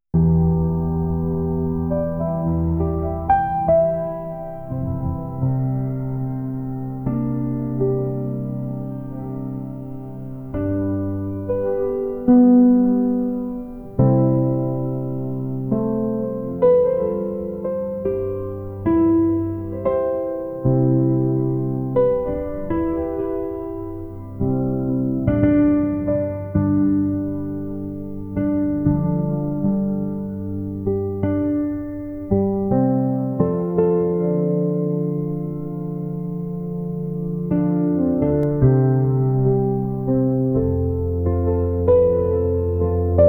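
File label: chill spring work focus soft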